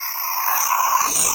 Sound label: FX REVERSE; REVERSFX KIT; AKAI